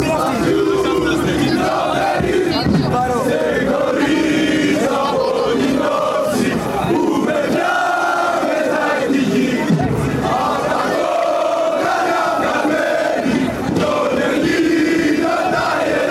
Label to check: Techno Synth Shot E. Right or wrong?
Wrong